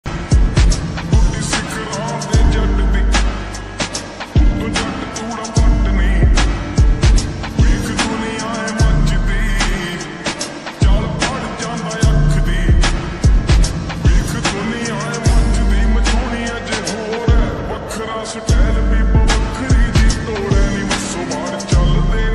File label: lofi ringtone remix